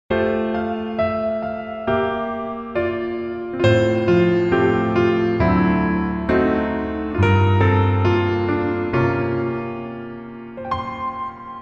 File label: Piano ver